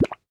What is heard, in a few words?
add fuel